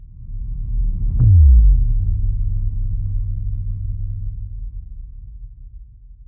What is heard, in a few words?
Down Swoop Impact